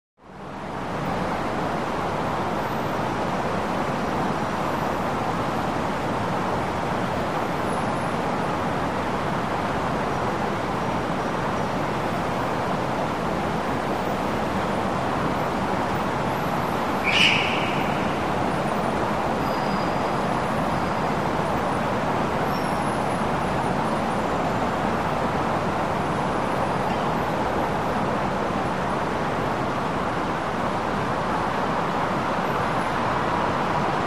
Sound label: Heavy Traffic Tunnel, big city